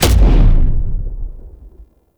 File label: Tank Cannon Punch; Enemies; Weapons; Lasrian Tank; Warframe